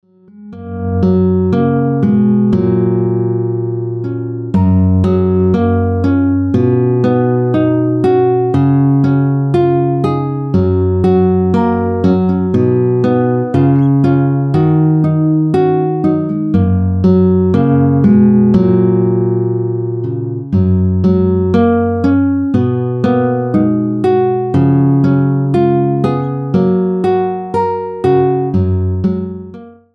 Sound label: BACKGROUND MUSIC